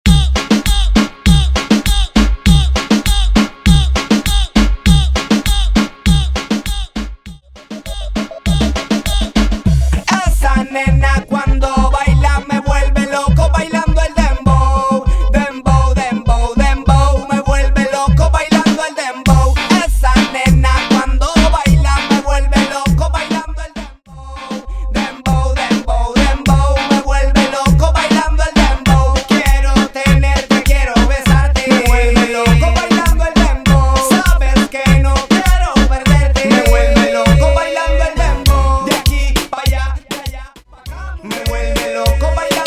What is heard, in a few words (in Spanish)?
Transicion Reggaeton To Dembow